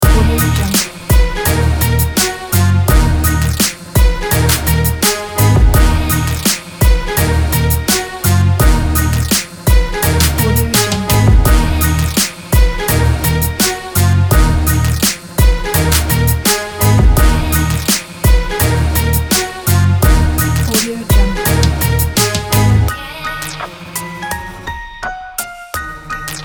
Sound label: colombian; baron